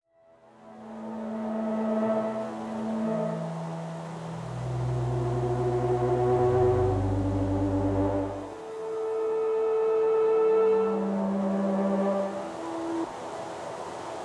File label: Sky sounds